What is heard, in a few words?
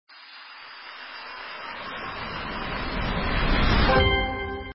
Open box; Blockman Go; Android